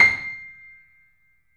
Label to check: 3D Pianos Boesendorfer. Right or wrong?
Right